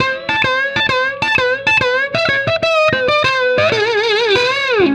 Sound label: Electric Guitar, Loops; Guitars